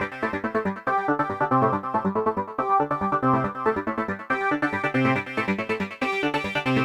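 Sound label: synth electro 140 A; Techno; Keys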